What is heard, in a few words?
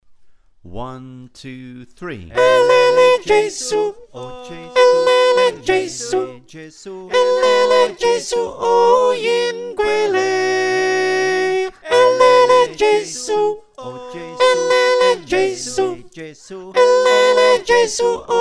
Soprano, choir